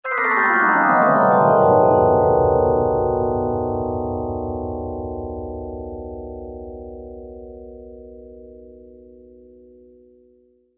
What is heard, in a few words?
Haunted Chimes, Scary Tone Sound Effects; horror